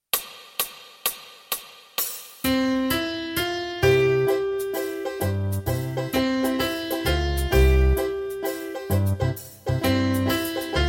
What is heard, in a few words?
Piano